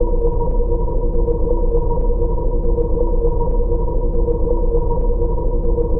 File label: FX, Futurist Drum & Bass